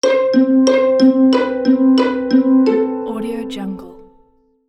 notification conclusion musical alert long; kids game; mission fail

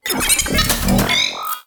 mechanism move bot small; soundmorph

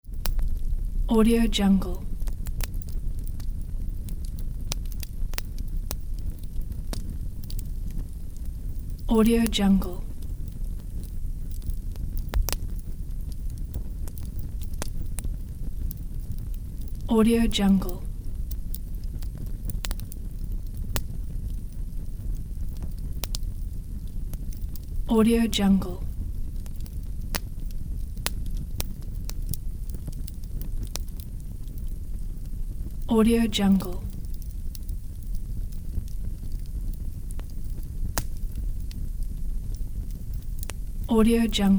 fire crackle

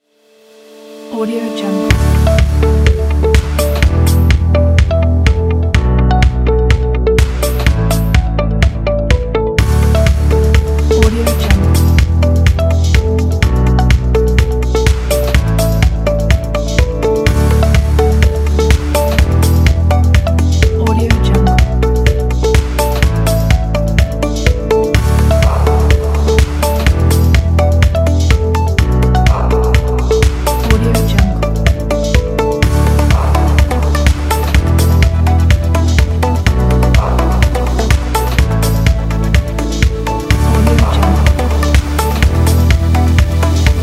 fast motivational

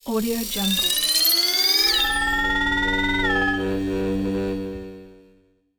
scifi device

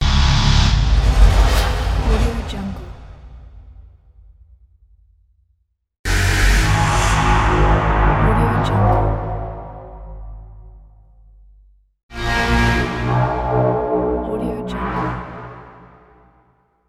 dark horror

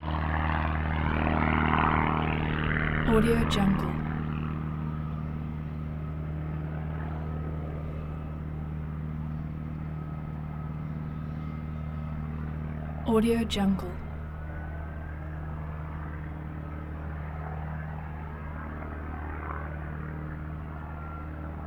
biplane fly around does stunts away; prosoundfx

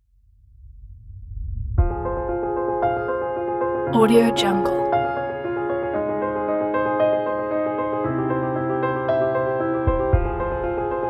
epic inspiring cinematic piano

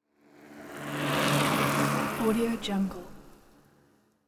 electric bike pass by

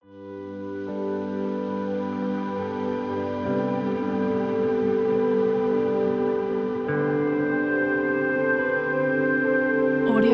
calm peaceful uplifting; music waves production

